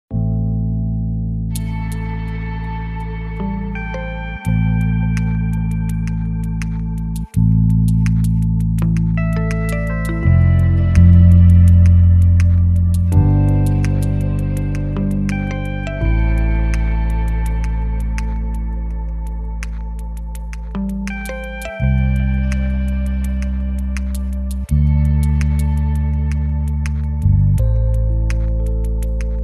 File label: Harmonicland